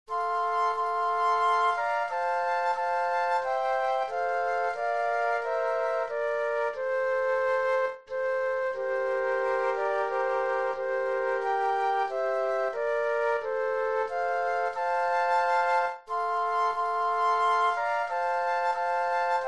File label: Flute Trio; christmas